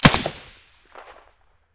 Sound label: sa58 silencer stereo